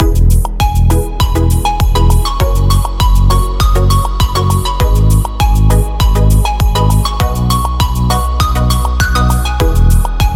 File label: Full Loop